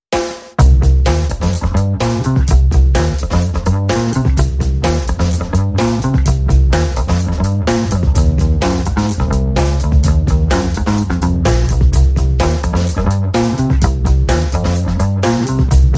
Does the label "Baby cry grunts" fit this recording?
No